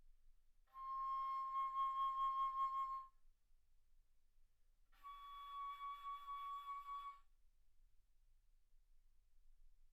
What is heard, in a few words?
Bass Flute ff C6 Db6